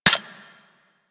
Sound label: M16 Mech, rifle; Wraith